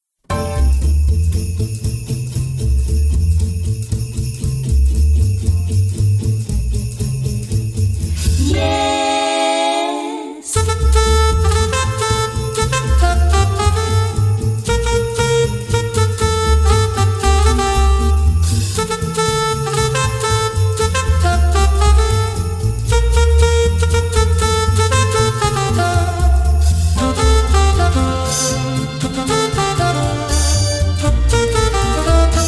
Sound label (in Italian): Natalizio Jingle Bells Sax